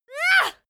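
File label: female attack ya, screams; schreie; menschen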